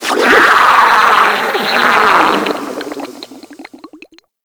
Grineer Frogman Death, Vocals; Warframe

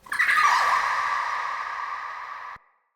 Day Pixies, Ambience

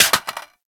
Reload Drop Mag Default; Warframe; Boar

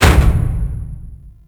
Menu Screen Transition; Warframe; Caliber Chicks Minigame; Title Menu

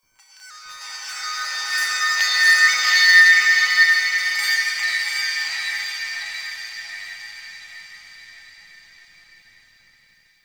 Metal Chimes; Warframe; Ambience; Nokko Colony

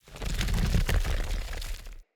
Stone Move Large Start; Puzzles

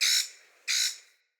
Tree Birch Bird; Trees

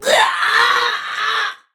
Death; en; Tau; Tau Dax Ninja; Battle Barks

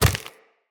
Root Spider Mother Step Dirt

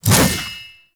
Shield Whoosh; Warframe; Duviri; Dax; Mace And Shield

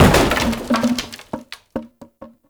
Wood Fence Impact; Warframe; Ambience; Nineteen Ninety Nine

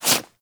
Generic Quick Foley; Warframe; Characters

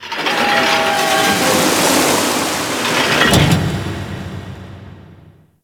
Ocean Hatch Large; Warframe; Grineer Ocean

